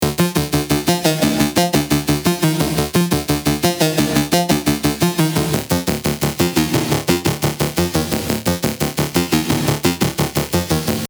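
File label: 174 arp loop, Drum & Bass; Tech Flavours; Drum & Bass Sample Pack inspired by the sub-genre of Tech Step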